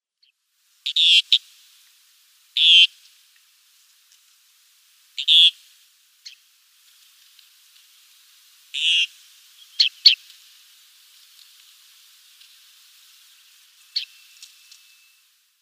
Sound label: Hoopoe-Lark calls